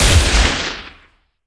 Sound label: Sci-Fi Weapons-Sniper Rifle Single Shot